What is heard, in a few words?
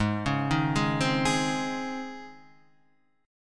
Db Major 7th Suspended 2nd Ab